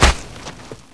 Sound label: shot